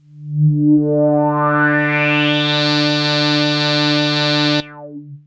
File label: Arp C D3, ARP Odyssey; synth; musicradar-synth-samples